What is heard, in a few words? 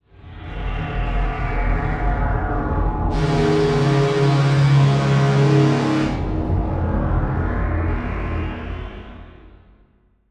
Title Transition Evolver Electrical; Adobe; Production Element